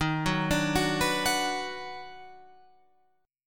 Eb Minor Major 7th Sharp 5th Eb down Guitar Open F, chord; EbmM7#5; arpeggiated; JGuitar